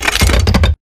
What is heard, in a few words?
Metal Jog; Titanfall 2